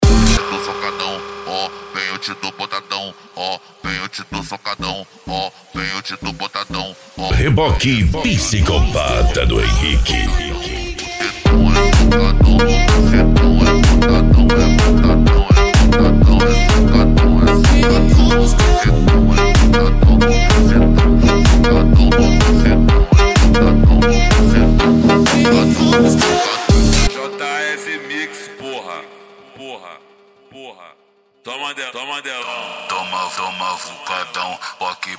eletro funk

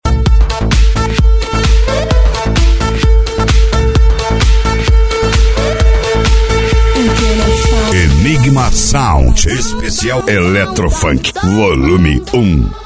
especial eletro funk

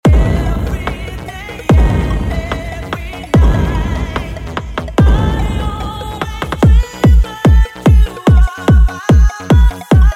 esp pancada

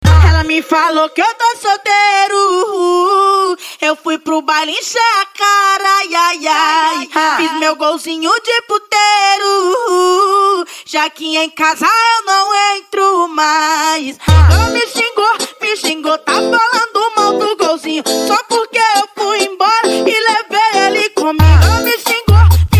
mega funk e funk mega bass

